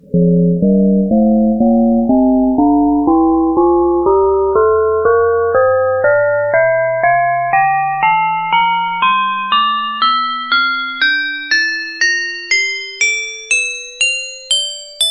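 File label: glockenspiel scale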